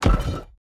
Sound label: environment building barrier place